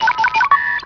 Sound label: Fx Message